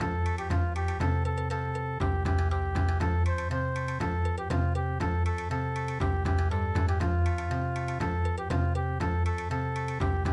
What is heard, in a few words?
Bon Odori